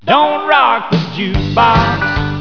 jukebox

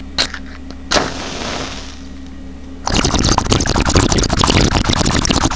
BONG HIT

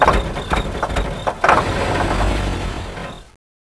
car klunk

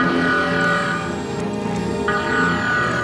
borg alert

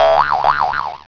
scaird boing

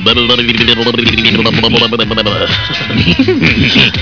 lips

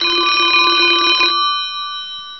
phone rng

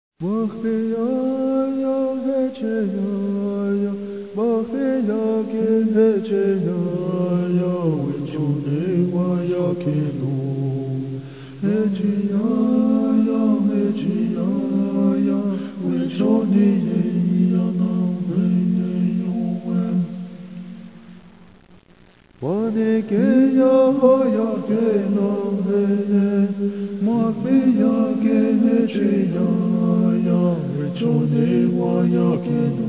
healing

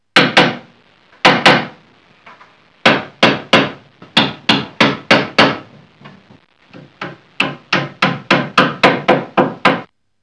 hammer

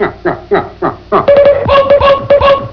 nyuk nyuk

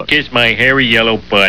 KISS BUTT

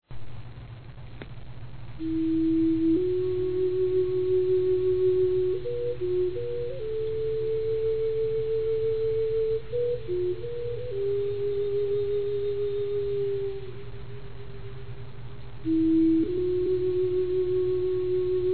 flute

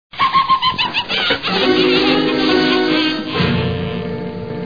witch lol

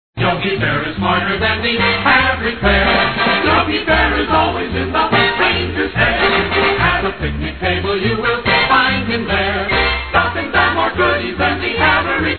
bear song

